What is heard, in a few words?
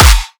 drum kick thicc clappp; Virtual Riot; Drum Hits